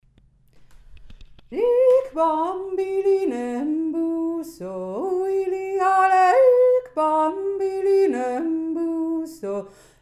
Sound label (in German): eine art pygm enjodler